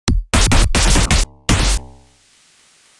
Strike なぐる; Elle; pc-98

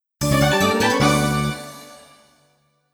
ジングル -「成功」; tokimeki memorial drama series vol.2 irodori no love song

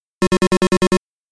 パックマンがサウンド・シャワーをはく音; Pac & Pal; Pac-Man; arcade

